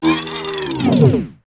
slider

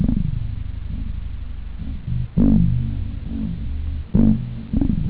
oak; OctaMED SoundStudio V1

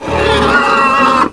PIG; mtm2demo; PC Gamer 4.2

